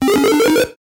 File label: Level Chest; SMB3